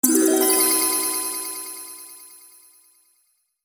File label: Magic harp glissando